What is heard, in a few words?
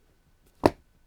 book; 本を閉じる音